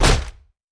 wolfman vs stone; LoL; warwick

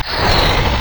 ramp; LoL; xerath base

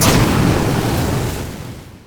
flamethrower; rumble

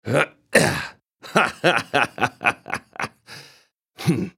laugh; Jayce

